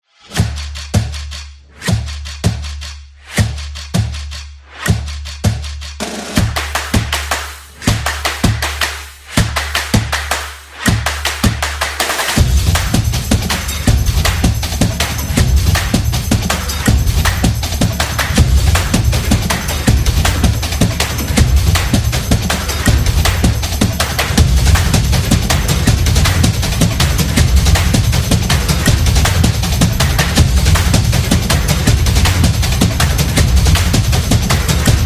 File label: Intro Beat, stomp and clap